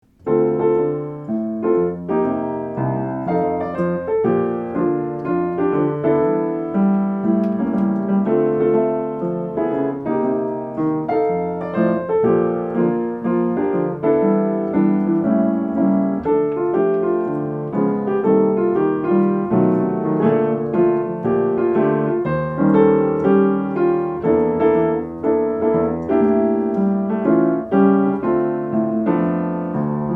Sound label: Bar Piano